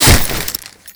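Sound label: destroy luggage burst; Call of Duty Modern Warfare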